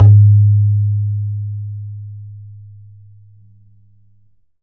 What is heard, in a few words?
BAYAN, Drums Percussion